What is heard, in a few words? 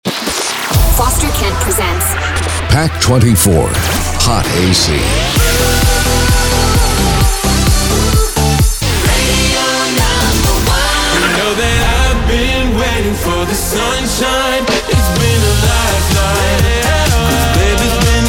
Hot AC; jinglepacks